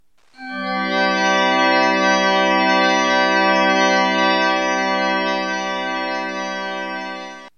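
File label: organ single melody fairy-tale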